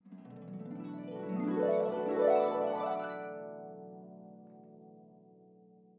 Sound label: Perfect Win